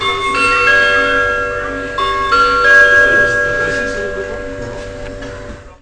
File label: SNCF